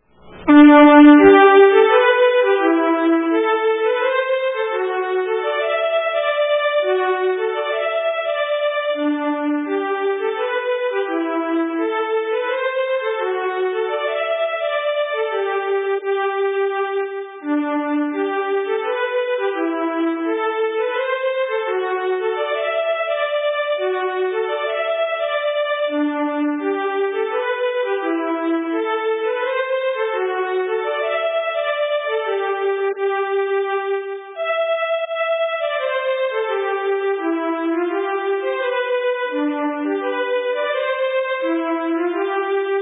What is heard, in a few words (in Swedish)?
Polska